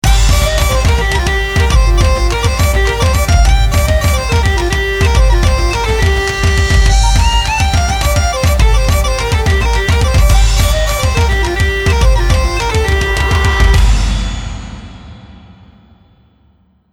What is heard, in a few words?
Celtic, Ethno Radio